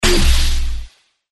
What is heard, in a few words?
ray shrink; Danger Mouse - Ultimate